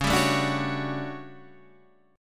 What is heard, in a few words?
A 13th C sharp, guitar chord